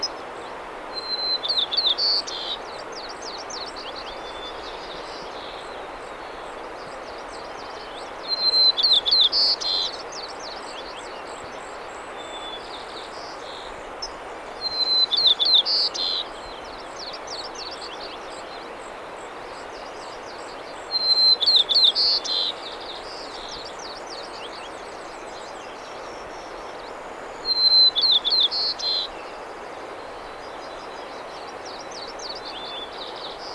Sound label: White-crowned Sparrow Zonotrichia leucophrys; sparrows